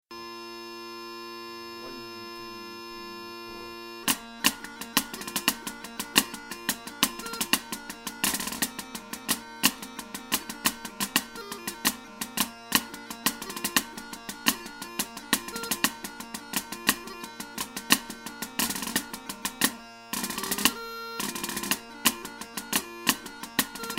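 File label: Donald Camerons Powder Horn Elementary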